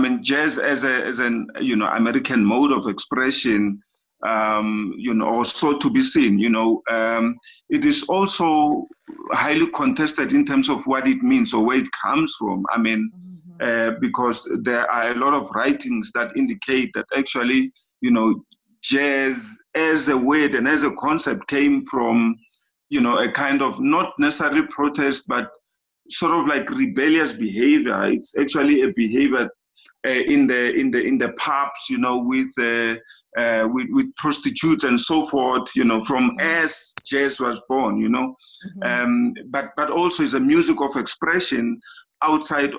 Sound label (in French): jazz afrique du sud; Mamelodi